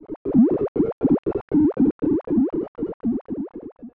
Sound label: Science Fiction Sci-Fi Electronic Radio Frequency